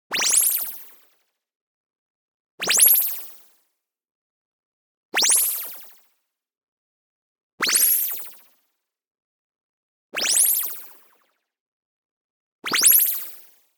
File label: LASER Delay; boom library; Anime Essentials